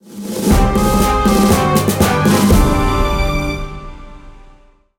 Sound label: Jingle